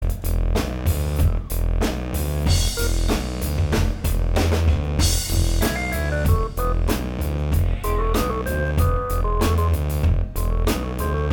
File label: Improv Jam